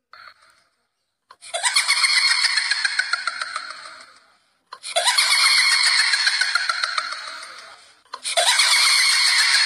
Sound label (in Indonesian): Suara Kuntilanak Ringtone